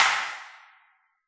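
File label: acid jazz kit snare; Dr 202 Kit 1; Percussion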